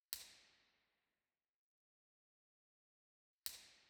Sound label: Musikverein, Theatres